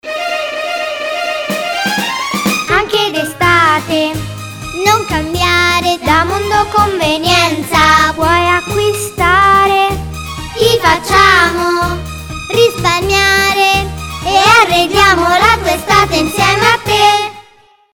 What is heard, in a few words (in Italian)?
Jingle